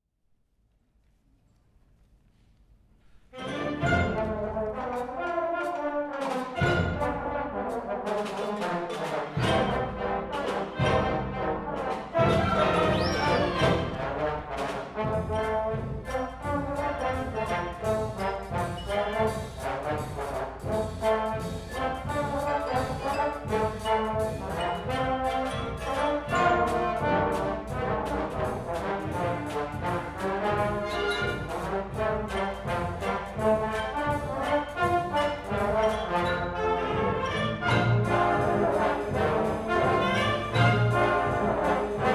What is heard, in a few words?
The Trombone Rag